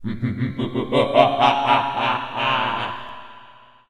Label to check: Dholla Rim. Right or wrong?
Wrong